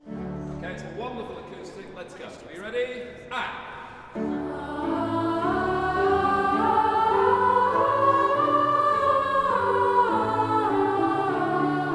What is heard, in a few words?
WARM-UP, Symphony of Voices